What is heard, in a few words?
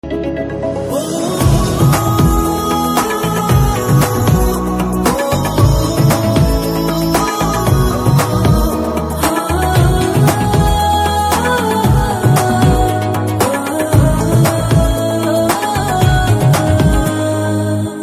BG Humming Ringtone